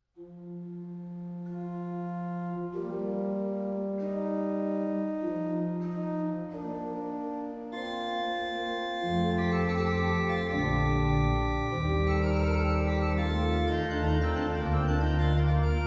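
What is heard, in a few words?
orgue; Matthias Weckmann; Magnificat secundi toni; Secondus versus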